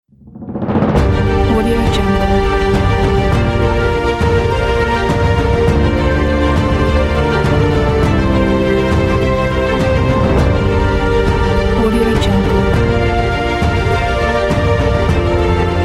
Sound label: cinematic ident